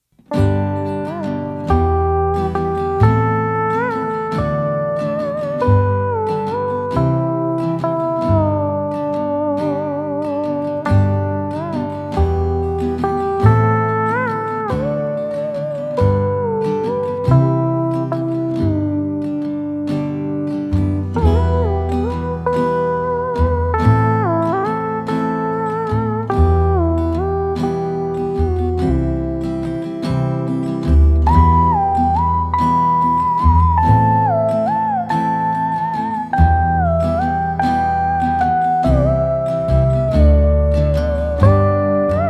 Skye boat song dan bau

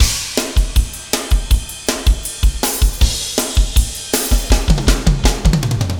4 bar fill 160 bpm

